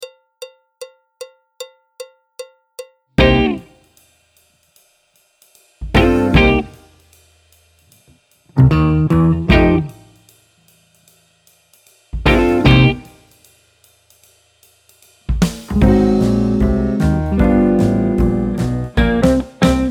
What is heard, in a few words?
Out in the Fields BT (trumpet); Jazz Syllabus Level 4